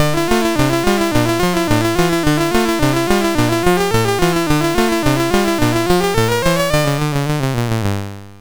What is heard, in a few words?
Laserwheel sid MOS6581R4